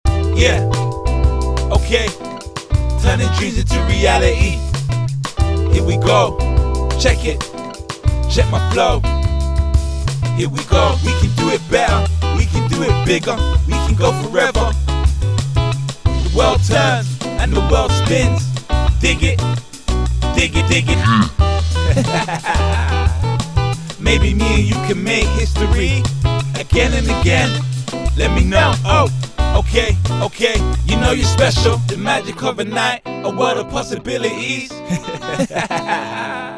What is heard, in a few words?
Vocals